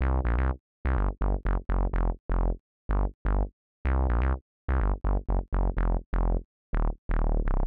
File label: 125 res synth bass E; PowerFX - Nu Jazz House